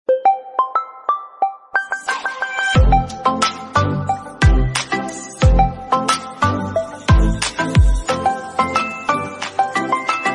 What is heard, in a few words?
Oneplus Ebullition Android Ringtone